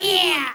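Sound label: FEMALE RAVE; Spectrasonics; Heart of Africa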